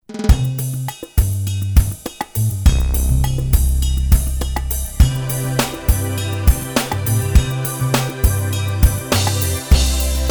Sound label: No Lead Guitar Key Of C